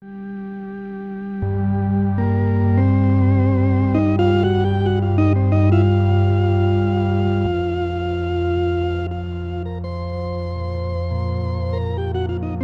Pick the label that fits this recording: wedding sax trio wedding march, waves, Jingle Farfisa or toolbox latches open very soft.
Jingle Farfisa